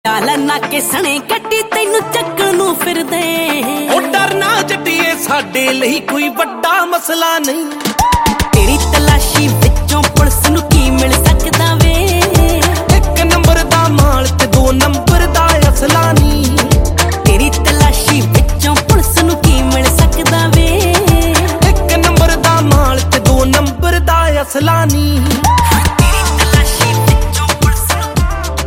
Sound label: Ringtone